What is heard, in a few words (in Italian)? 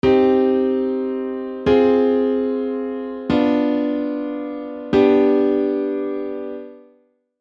Progressione accordi